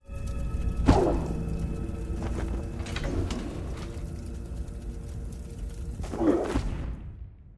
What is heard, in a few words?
dark archer idle, monster